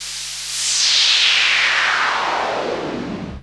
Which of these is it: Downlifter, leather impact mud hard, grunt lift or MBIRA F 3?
Downlifter